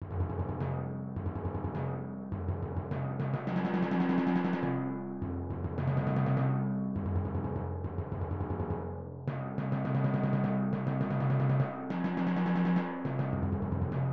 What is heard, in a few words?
Timpani